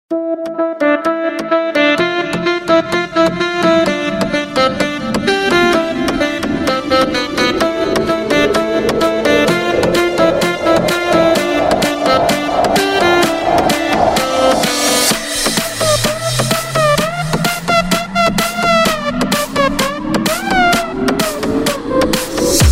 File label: DANCE RING